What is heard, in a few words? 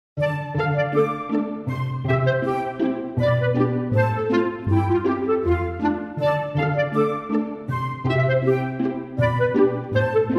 Woodwind